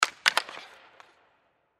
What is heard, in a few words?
Hockey Face Off, Hockey Face Off Sticks Hit; Hockey (Sound Effects:Sports)